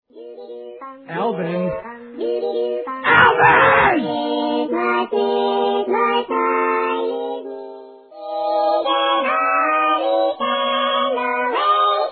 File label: Chipmunk